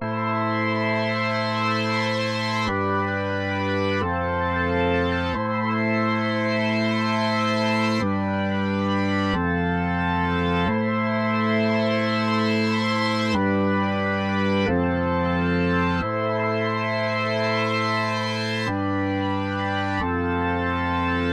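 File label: Synth Loops A minor 90 BPM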